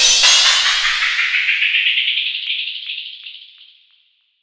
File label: CYMBALS, Disco House